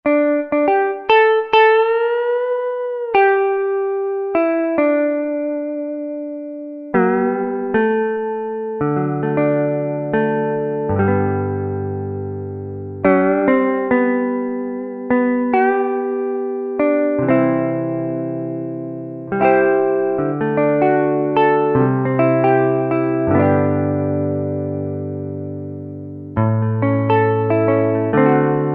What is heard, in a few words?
Tele Frnt PU; Roland SR-JV80-17 Country Collection